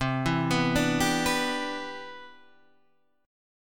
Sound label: E Minor 7th C